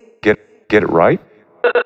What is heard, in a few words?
House Loops, Vocal Loops House 128BPM